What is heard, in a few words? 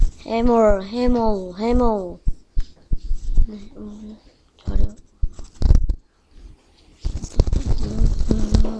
hammer